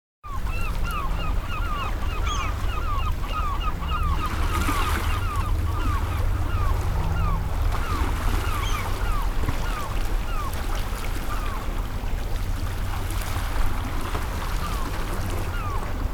boat ferry seagulls; best service Blue Box; sea traffic

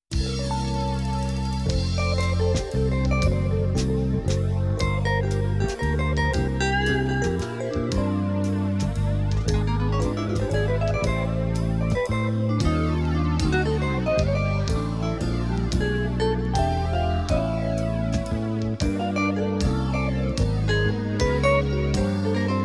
Slow Tempo 'jazzy'

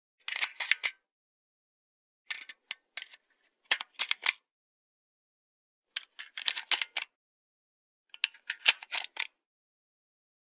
Load Bullets Into Magazine; Hollywood Edge; Heavily Armed

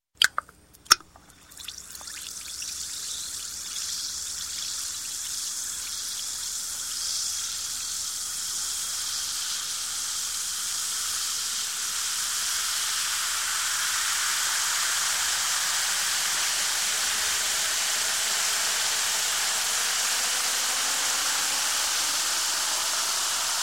Antacid Tablets Drop Into Water And Fizz; Sound Ideas 2000 Series

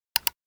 computer mouse; NCH Library

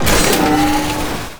Gun Draw Select Scifi, Weapons Foley; SFX Kit

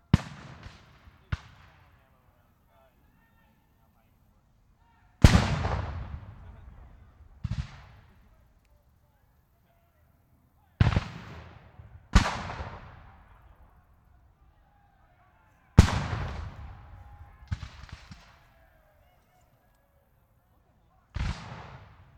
War Ambience Battle; Sound Ideas; Guns

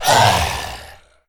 zombie attack; DeadFright

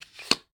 card draw; dice, cards, game related; NS Studios - ultra sound collection

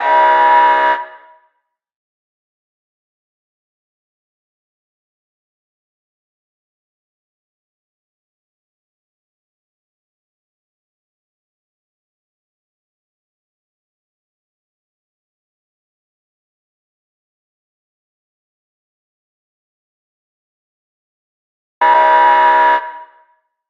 buzz; Pitch Black - A Detective's Demise

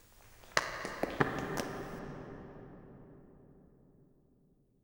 Rocks; Inquisitor 3

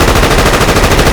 Assault Rifle Shot Loop; SFX Kit; Weapons

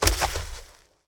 Bodyfall Grass; SFX Kit; Bodyfalls

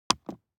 DICE on Neoprene, Drop, Standard, Two Dice; Samples; ArticulatedSounds; DICE Sfx Party (Extended)

